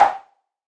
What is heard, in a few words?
crunchy kit sn; drums; hip hop; STYLE KITS (Hip Hop, Etc)